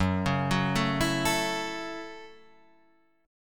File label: A 6th Gb